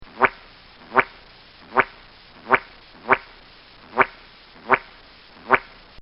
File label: Comical Sound Series Spits; Funny Sounds